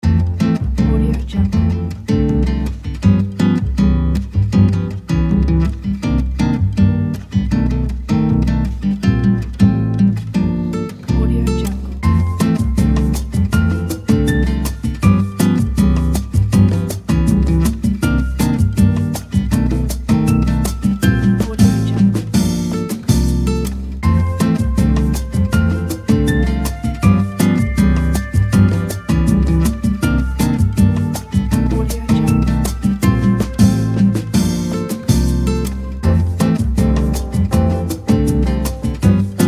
elevator music

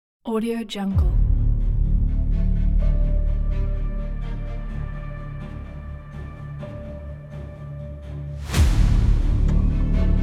epic dramatic trailer

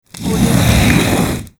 dragging basket slide; electronplanet

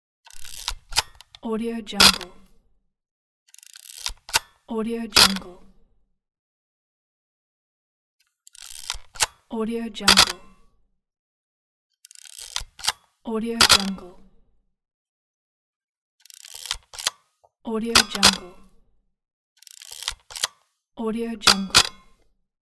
35mm film camera sounds

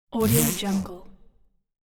zipper foley movement

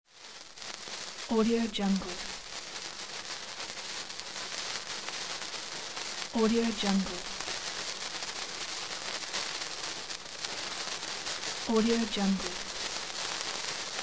sparkler burning

